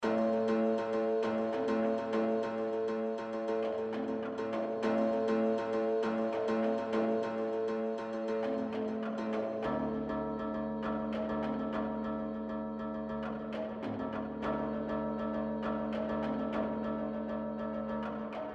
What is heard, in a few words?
Tension Block